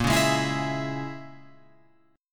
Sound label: A sharp Minor 7th Flat 5th, Guitar Open F chord; strummed; 10,8,8,11,11,11